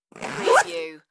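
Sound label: Quick Luggage Zip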